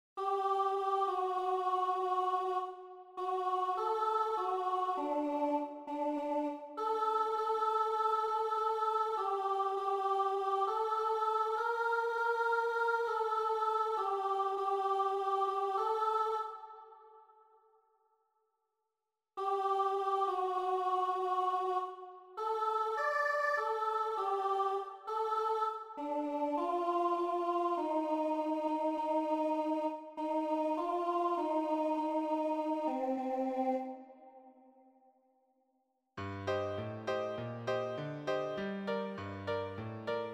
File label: Separate voices ALTO